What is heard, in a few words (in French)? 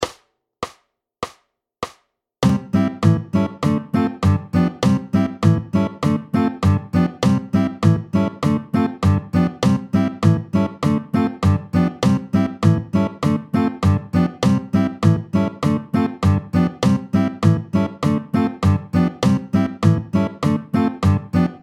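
Vite tempo 100, L'anatole, Vite, tempo 100, guitare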